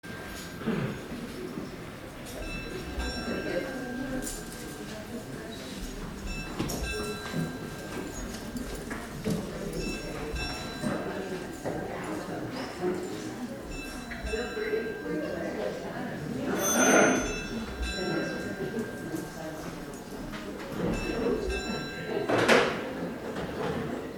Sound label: Bell Before Mass